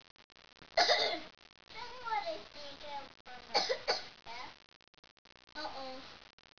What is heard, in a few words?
cough